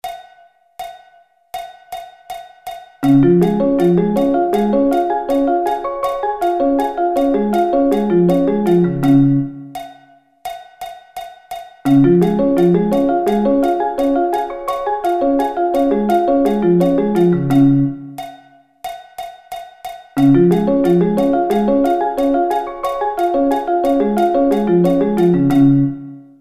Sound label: Db Arpeggio Inversions